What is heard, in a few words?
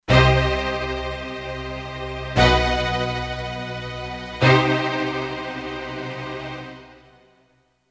Sfz Trem Strings, Kurzweil K2500X; Factory Programs